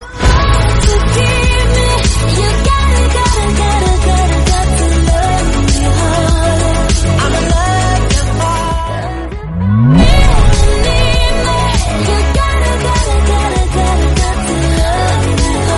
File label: romantic english